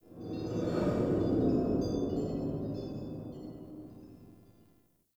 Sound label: Under City Ancient Wind